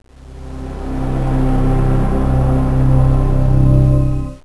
logo snd; Catch the Bunny II

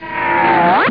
lid on

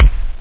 bdrum

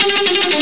guitar

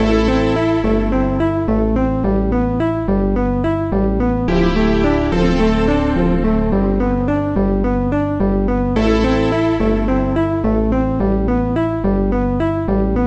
slow chord

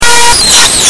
bull elephant; animals

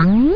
Got gem; Super Maze

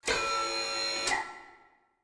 CLAW SPIN, DROID; Messiah

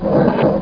roll ball

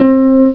Harp C4; Prince of Persia

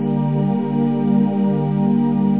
chord silk water strings, minor; OctaMED SoundStudio V1

